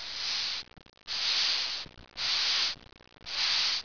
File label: hiss, Roaches; Ronalds Roaches